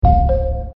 ding dong

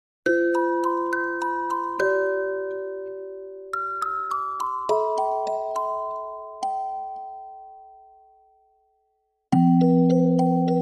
Music Box Tiny Light; Anime TikTok

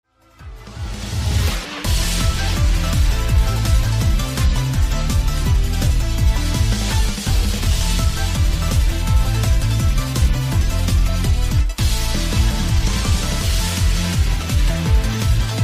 Instrumental

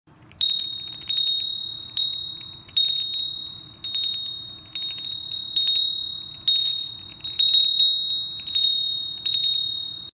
cafe bell

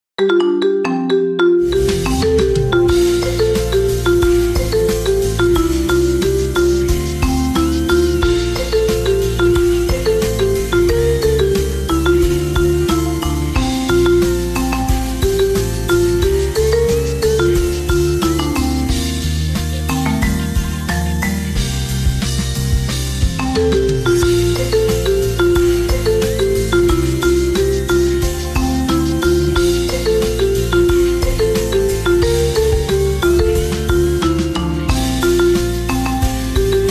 Marimba

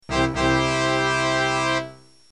win

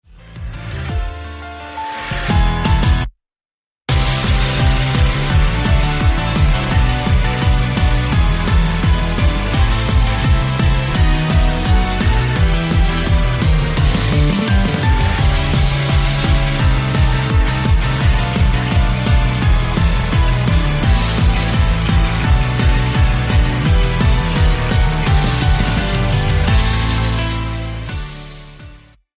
karaoke

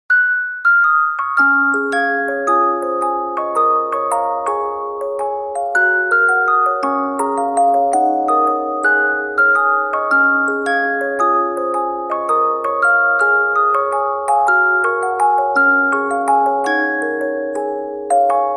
Music Box

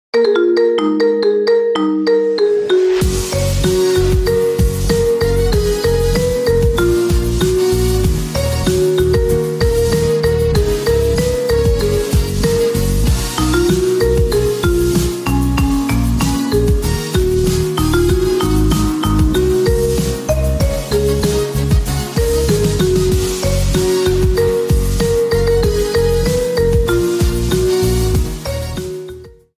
Marimba

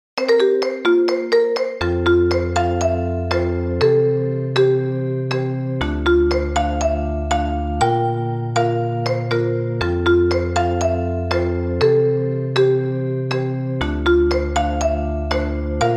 iPhone x

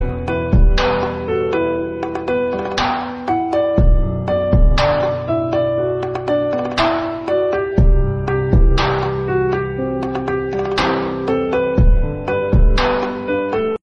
instrumental

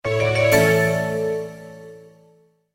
cancel sms

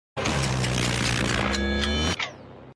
stop time

